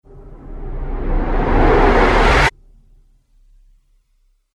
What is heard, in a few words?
Riser Abrupt Stop Chorus; Rise And Dive